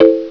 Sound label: Reggae (A min.); Sound Library 2000 - Wayzata Technology; Instruments